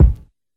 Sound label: fluffy kickdrum sound a sharp key, kick drum one shot tuned to the A# note; kick drum samples